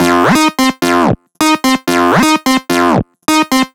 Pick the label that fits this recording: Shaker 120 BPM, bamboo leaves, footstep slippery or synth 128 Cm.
synth 128 Cm